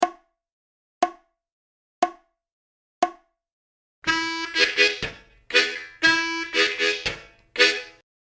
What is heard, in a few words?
12 Bar Country Style Blues Lick 60BPM, harmonica